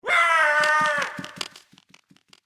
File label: worker fear; New Yankee in King Arthurs Court